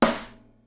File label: SNARE, Live Snares